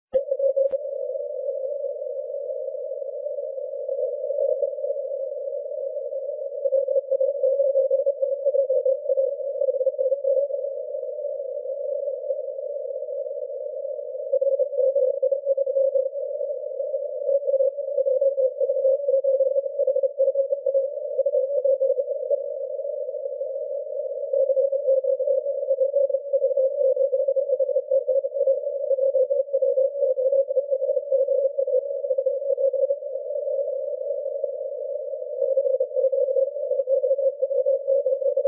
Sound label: 20m cw